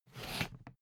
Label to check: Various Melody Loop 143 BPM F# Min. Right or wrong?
Wrong